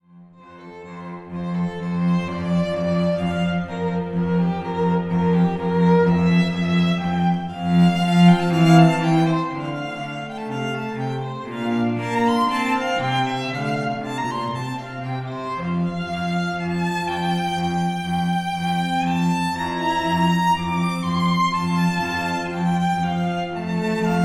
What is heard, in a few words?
String Trio